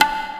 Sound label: normal hit normal; JesusOmega {NM} 『Planets』